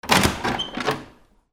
DOORS

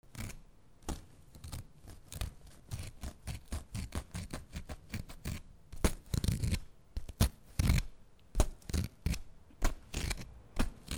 RIPPING

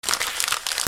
PAPER

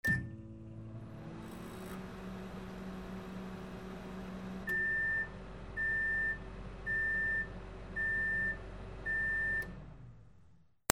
KITCHEN

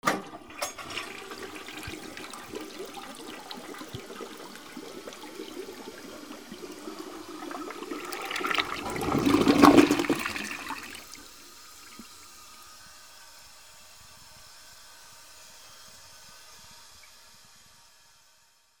BATHROOM

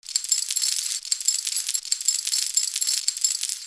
BANGS

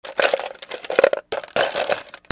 OFFICE

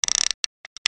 SCIFI

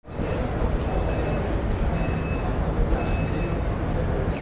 AMBIENCE

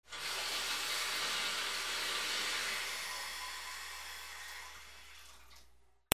HOUSE

